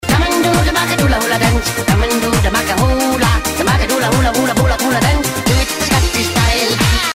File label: hula dance; taunts; props